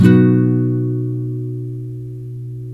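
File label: NYLON, B MAJOR DOWN, CHORDS; Spectrasonics; Hans Zimmer Guitars VOL-1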